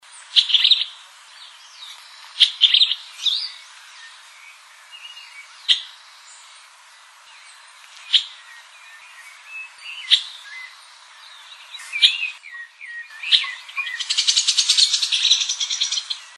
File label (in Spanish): voz benteveo rayado